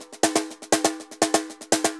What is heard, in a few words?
TAMBOR, DRUMS SAMBA; Best Service ProSamples vol.14; AKAI